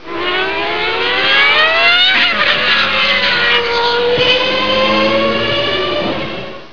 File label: race; Motorola MPX200